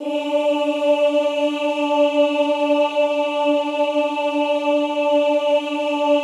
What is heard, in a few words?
OOH D3, Classical Choir; Best Service; Extended Classical Choir; OOH MIX